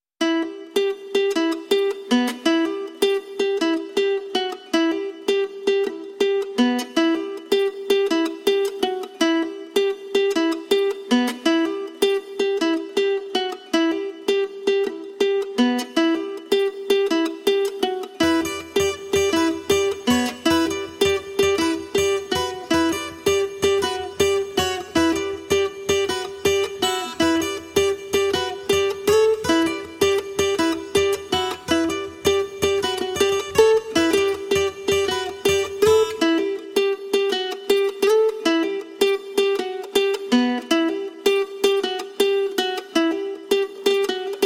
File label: social tango guitars